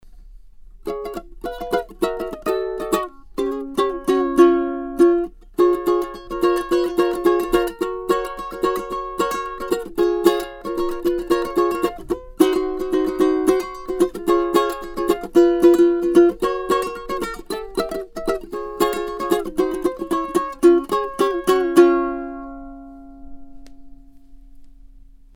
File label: ukulele